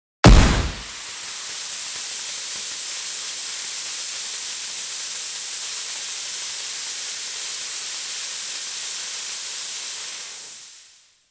Smoke grenade explosion air hiss

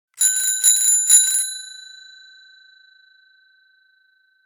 Multiple rings of a metal bicycle bell; vehicle

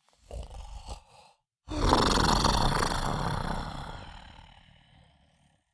Cartoon lion voice snore snoring

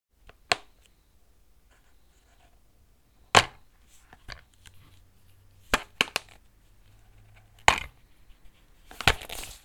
Plastic DVD case handling open and close

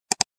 Old mouse click

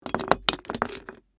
Grenade roll on hard surface; gfxsounds

